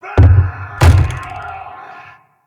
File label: Castle Siege Roof Boss Bang Door A; Encounters